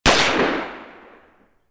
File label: GUN, crime; zones